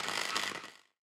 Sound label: robot repair; Factorio